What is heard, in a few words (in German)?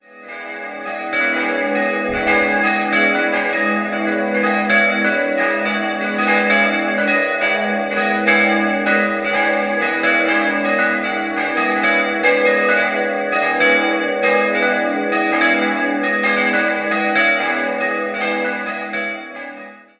Adlhausen, glocken